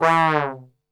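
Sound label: E 1 trombone; Best Service; Brass Super Section; 90 s Sample CDs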